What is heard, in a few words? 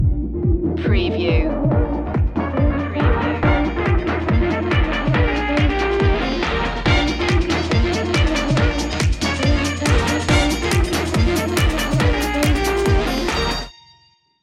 shuffle kerfuffle stinger